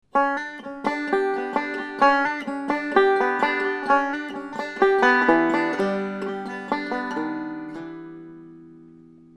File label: hammer